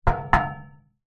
impacts metal road barrier knocks hand

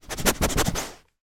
dog sniff smell close

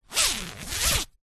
clothing jacket cloth zipper; zapsplat

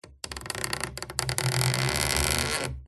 Stress Creak Squeak Groan; PMSFX

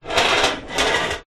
Metal Spring On Large Sheet Metal Sliding; sound spark

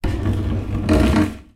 tub plastic roll in bathtub; foley

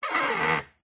Wood Stress Creak Squeaks; DPA4060; pmsfx

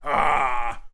Human Evil; little robot sound factory

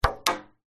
household pool gate glass door plastic lock

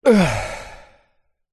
human male breathe out exhale from holding breath; zapsplat

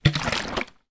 household water spray bottle shake water inside; sound effects one

